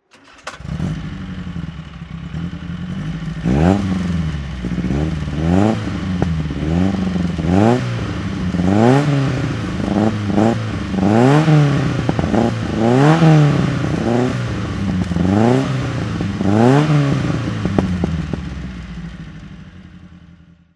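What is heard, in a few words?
lotus elite s1 throttle, Engine sound; Lotus Elite series 1, 1960; V3D/ ClassicarImages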